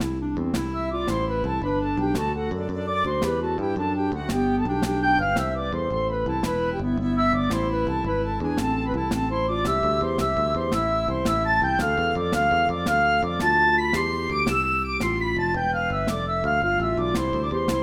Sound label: Irish Lilt Dance; MTWFC VOL1 Audio Accompaniments